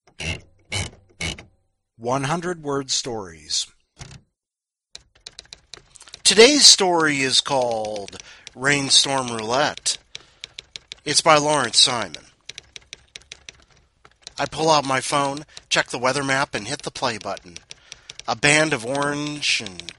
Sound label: rainstorm roulette